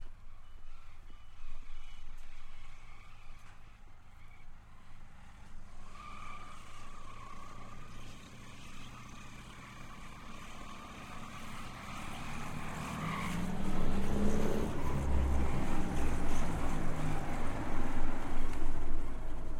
Tank Driving and Stopping, Revving, Close To Far; MS Stereo; Double Trouble Audio; Tanks and Jet

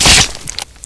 butt impact, antlion; hl2

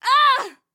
Female Pain; AudioDesire

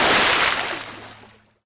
plasma stop, cremator; hl2

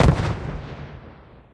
combat, ambient combat far; hl2

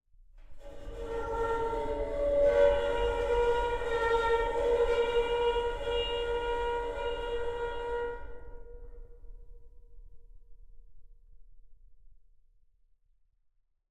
Sculpture Edges; Colinized; Colin Lechner; Colinized Sculpture Library Vol. 1

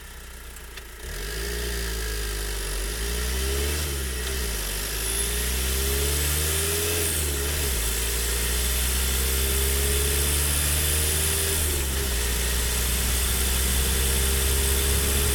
Ford transit connect T200 driving on asphalt; Eneas Mentzel

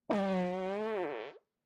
Human, Fart; Raconteur Sound; Odor Free Farts Library Vol. 1

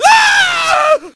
scream short; hl2

